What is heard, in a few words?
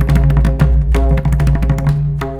MRIDAN, Indian Traditions; USB Soundscan vol.45; AKAI